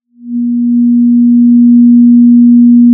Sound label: Sound of a cruise ship horn